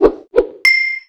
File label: Correct; Golden Gloves